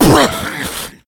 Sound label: shoved, infected